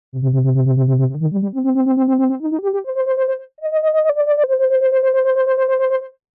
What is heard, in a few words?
LFO; Kobol; Ney